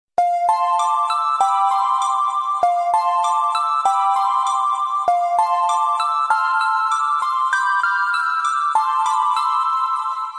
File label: Cute Message Tone